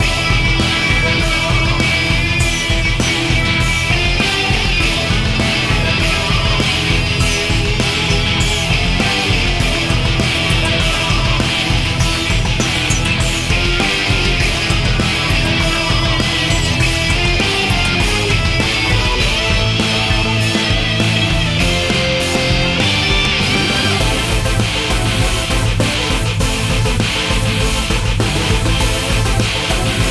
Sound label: cave boss; dnf ost; screaming